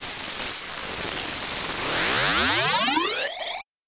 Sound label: whoosh; Whooshes and sweeps